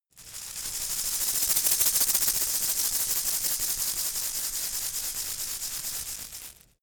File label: insect shaker rattle dynamic